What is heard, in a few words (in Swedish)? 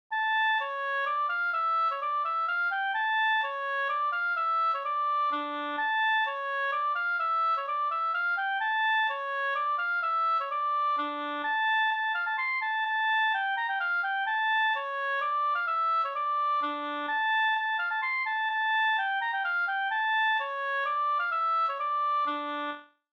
polska